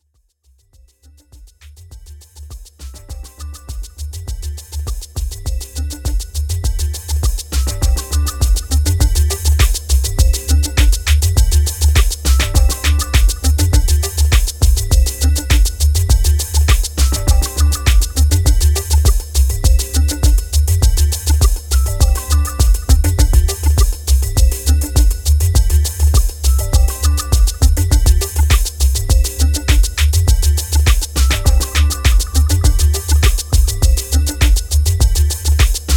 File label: Filter Beat